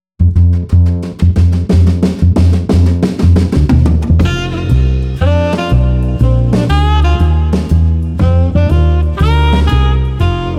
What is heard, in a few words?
Jazz Trio Good Vibes 120